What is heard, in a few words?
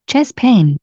chest Pain